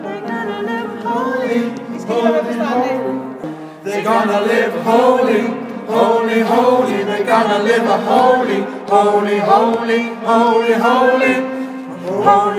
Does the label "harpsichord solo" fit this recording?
No